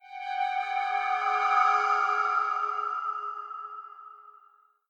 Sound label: MUSIC FLUTE WRONG; adams venture origins